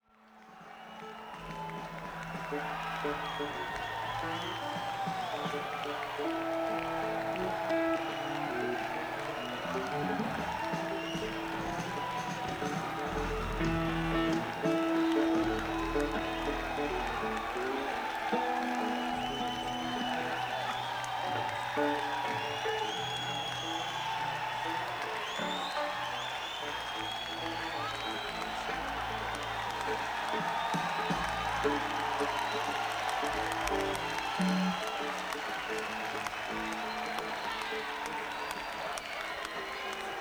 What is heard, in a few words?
Crowd Tuning